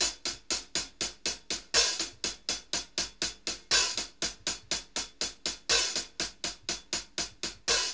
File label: HAT EIGHTS; Sampleheads; New York City Drumworks VOL-1; KD JBEAT 120